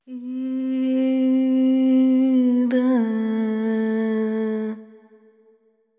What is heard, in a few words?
ETHNO FEMALE, Vocals; ethno2